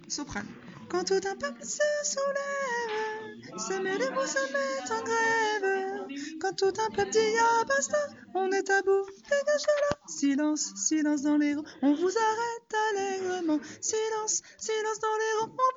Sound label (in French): soprane